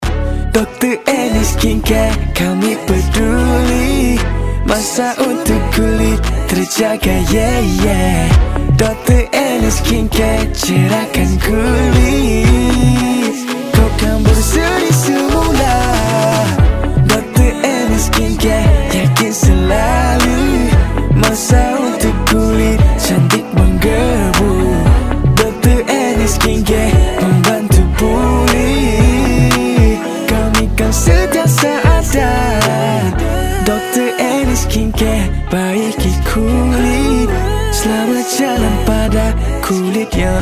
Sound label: Skincare Jingle; Dr Anne